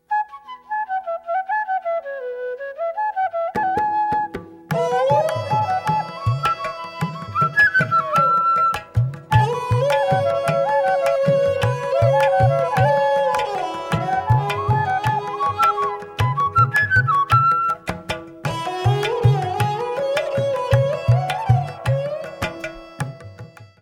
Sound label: mridangam; Trichy Sankanran